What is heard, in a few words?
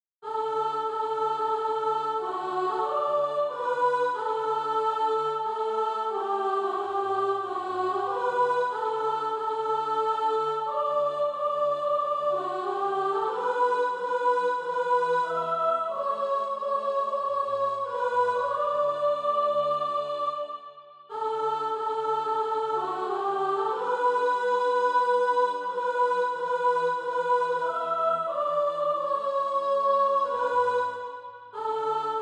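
soprano, Sheet music (SATB) including Practise Music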